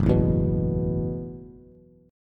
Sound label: Gb Minor 11th Gb Bass Bass Standard; strummed; 2,0,2,4; JGuitar